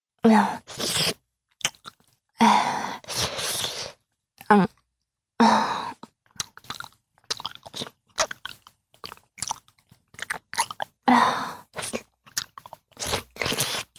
チュパ音, ASMR; フェラチオ(弱)：玉袋を舐める

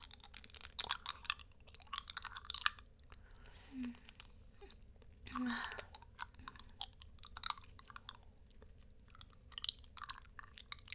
フェチ 飴 ループ 両耳 バイノーラル

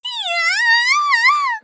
いやああああ！, 姉

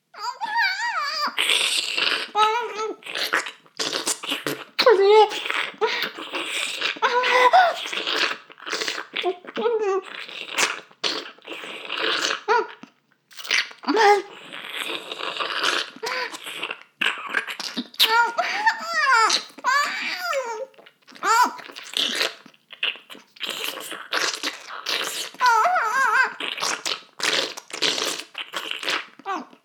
フェラ音声; 少佐殿; 牧場戦腺

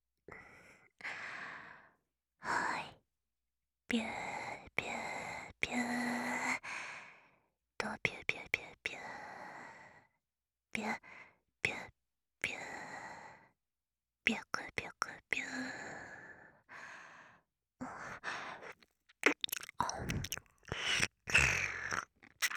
耳舐め びゅくびゅくびゅー 左

